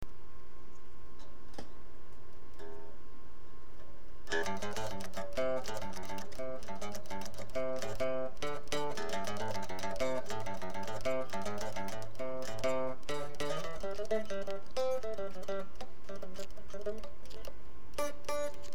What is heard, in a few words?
guitar